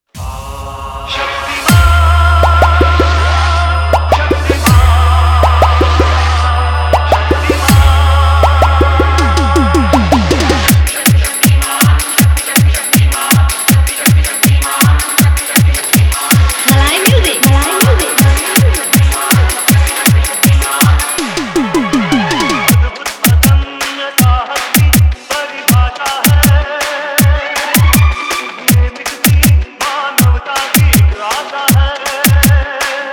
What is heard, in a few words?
Song Hard Ghanti Mixx